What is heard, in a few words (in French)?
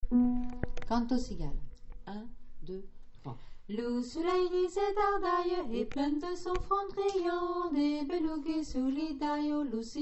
deux voix; canto cigalo